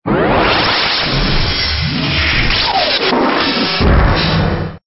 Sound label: Stinger; STINGERS